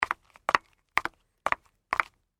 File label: Footsteps High Heel Shoes Walk Fast; Footstep Sound Effects; Footsteps (Sound Effects:Human); SFX Bible 6